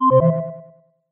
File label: galaxy note 7 sms ringtone; galaxy sms ringtones